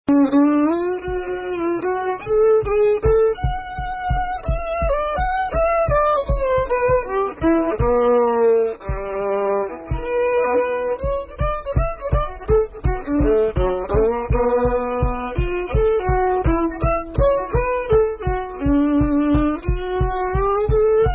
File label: Castillian Waltz